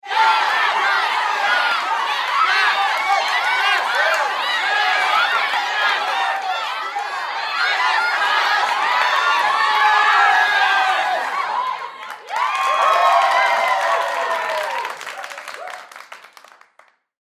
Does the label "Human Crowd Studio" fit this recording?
Yes